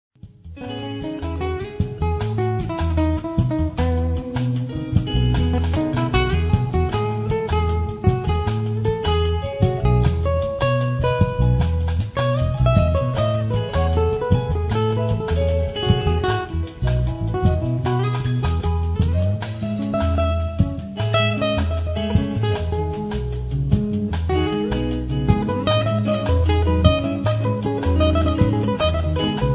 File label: guitar solo